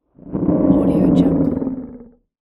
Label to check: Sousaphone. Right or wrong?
Wrong